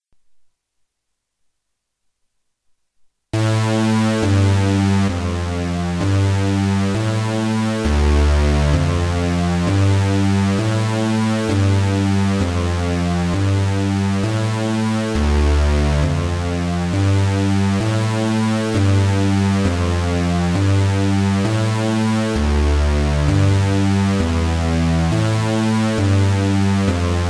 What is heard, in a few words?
synth